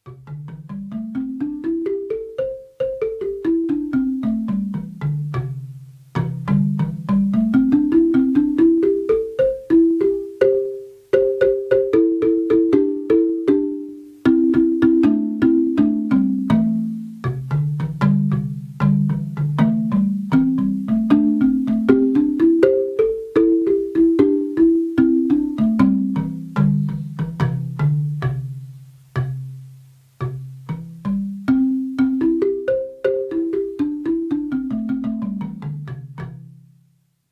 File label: Grand Marimba